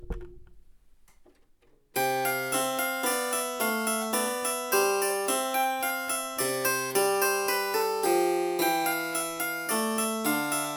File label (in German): Spinett